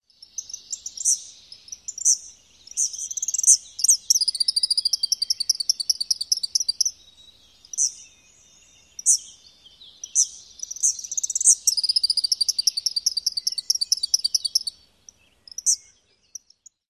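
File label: Eastern Kingbird dawn song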